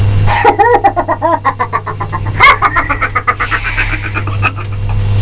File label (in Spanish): risa